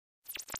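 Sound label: Device Activate Button, radio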